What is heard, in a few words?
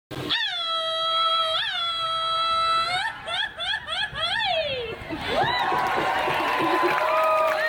mexican lady grito